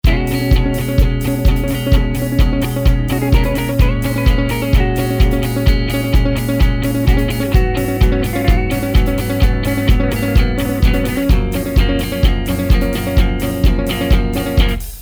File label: chromatic